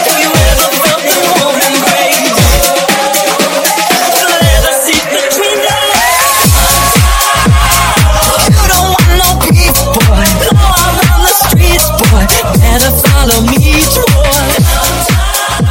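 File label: 118bpm B flat minor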